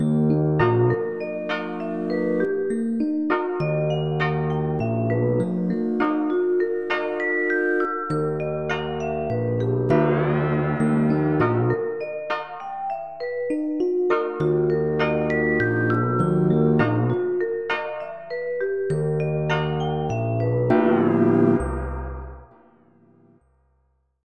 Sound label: drum